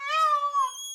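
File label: monkey hit; sinfar haks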